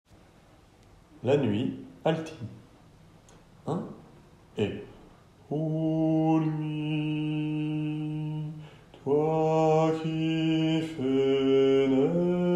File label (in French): La nuit Alto; Rameau